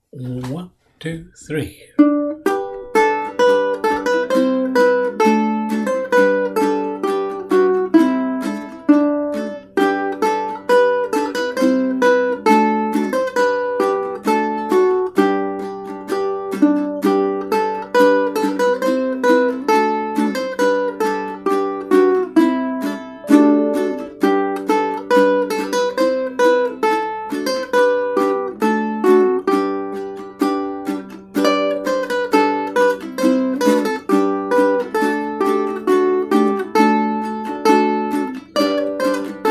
Uke 3 Practice; Bransle Des Chevaux

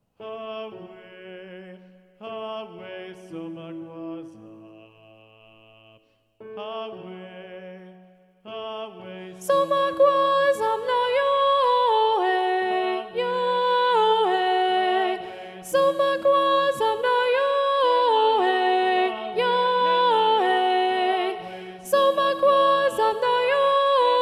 Somagwaza SATB - Soprano Predominant

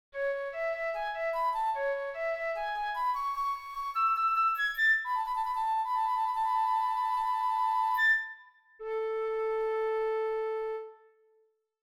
Spanish Capriccio in A Major; 4th Movement RK

